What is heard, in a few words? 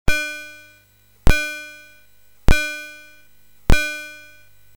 Gong BASIC, MOS6581R3; Commodore